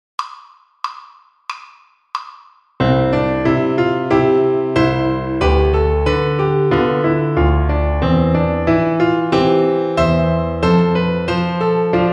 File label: String Gym, Piano Accompaniments